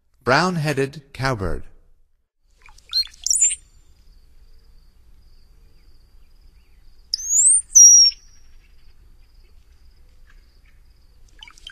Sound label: Brown-headed Cowbird; North America; Stokes